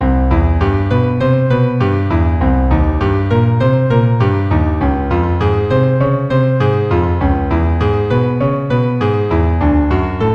Arp Ex 2 100, keyboard

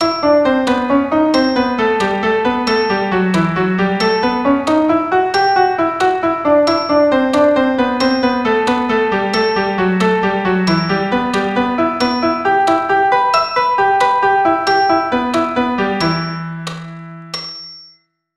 Am melodic

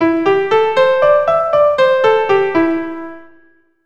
C Major Pent Mode 3

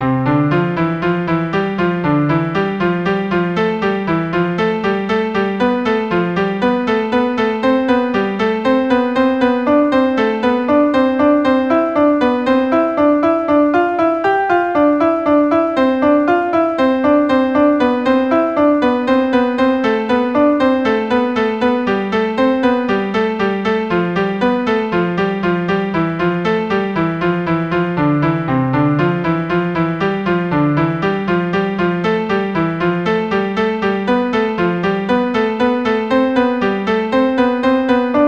Hanon 14 compete

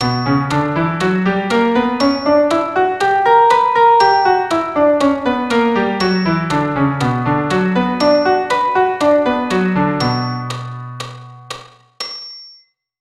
Bm harmonic

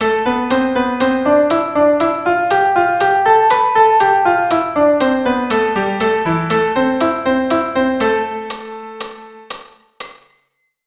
Am natural

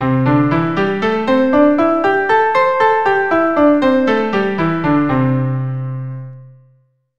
C maj pent 2 octaves, keyboard

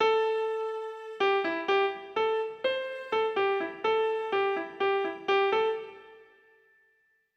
Am arp, keyboard

